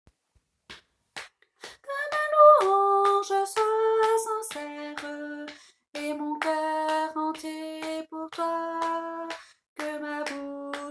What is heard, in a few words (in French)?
tenor basse